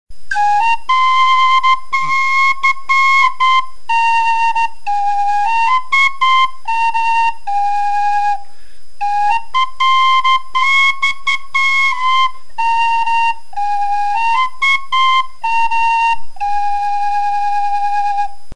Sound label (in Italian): Sonette Canna